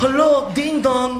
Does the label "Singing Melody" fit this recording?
No